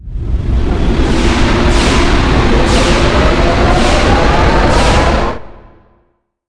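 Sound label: transfer shield to energy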